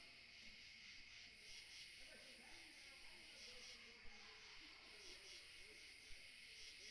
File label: laughter dead mans